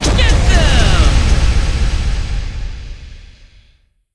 activated chilling cheer

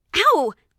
Damage

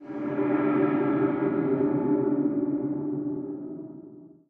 UI Halloween Ambience; BTD6

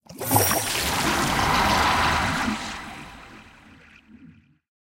Sulfur Springs Sequence

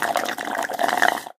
Prop Drink Straw

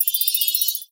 UI Bar Ticker Start

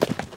Horse Footsteps; Legends

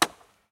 UI Prop Axe

